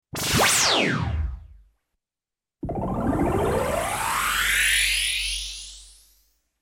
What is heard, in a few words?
POWERSHOT